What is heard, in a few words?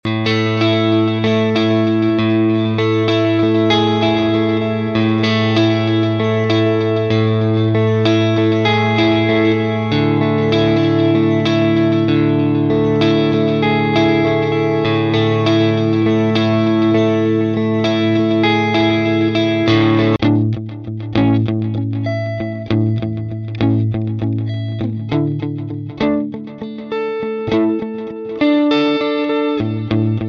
KPA AC30